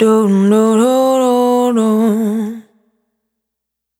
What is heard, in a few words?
Integration, Vocal Samples, Single Voice Melodic Loops; Singles